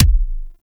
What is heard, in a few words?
Pistol Punch Alt, weapons; Warframe; Thanotech